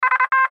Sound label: Radio Morse Code; Morse Code Letter V; Morse Code (Sound Effects:Electronics); Blastwave; Warfare